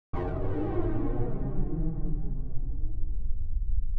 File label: Korg Flanged Blast